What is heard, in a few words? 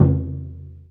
SURDO; E-MU Producer Series Vol. 5 – 3-D Audio Collection; 3D Percussives; 3D Ethnics